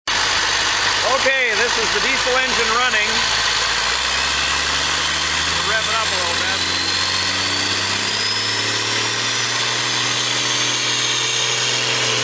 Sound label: Perkins diesel engine running on stand